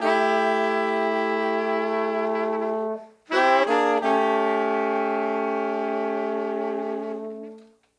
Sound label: Horns, 70s Analog Funk; Sonic Foundry (Sony Creative Software); What it Is!